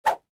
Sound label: Whoosh high; The Binding of Isaac; SFX Slots